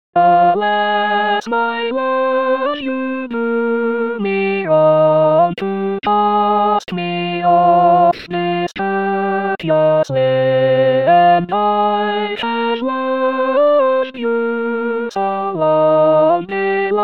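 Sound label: alto; Greensleeves; arr D Andrews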